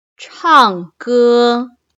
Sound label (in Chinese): 唱歌